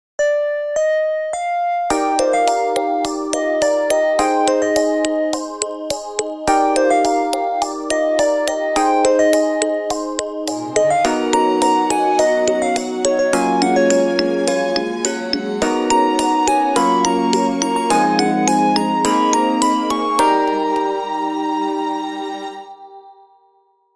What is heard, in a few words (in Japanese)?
クリスマス ショート